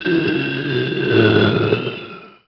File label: zombie die, male